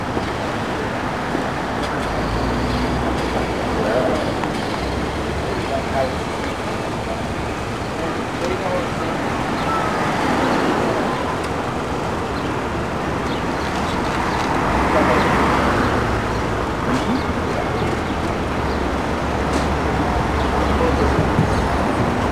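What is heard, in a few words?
Environment exterior street scene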